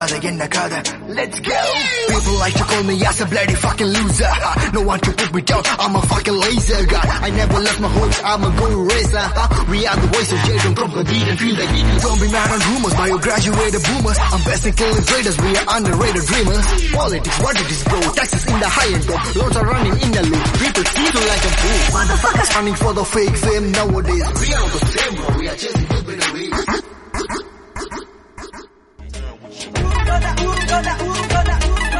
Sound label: Tamil Rap Song Ringtone; Vidamuyarchi